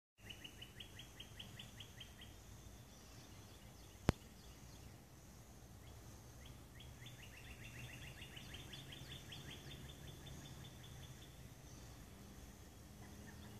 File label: outdoor sounds